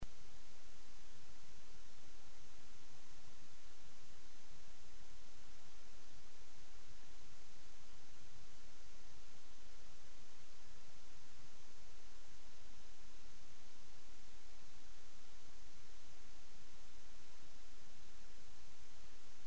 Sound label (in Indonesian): morse abjad; cw